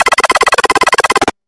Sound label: arrow volley; Weapons; freepixel